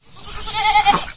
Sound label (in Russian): koza bleet v hlevu